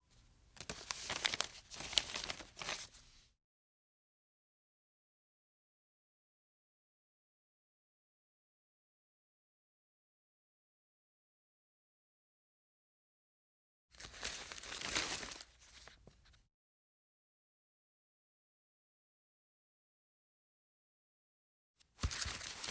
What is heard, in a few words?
Large Book Page Turns; Fairlight Sound Lib